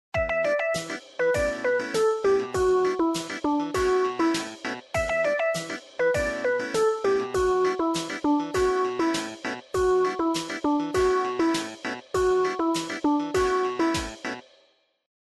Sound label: Melody, Ring; Doro Liberto 820 Mini